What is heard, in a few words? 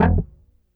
EFX BASS SHORT E1; Sampleheads - Ultra Freakin' Bass